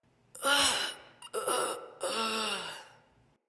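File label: Cough Walk Female; Battle Barks; Envoy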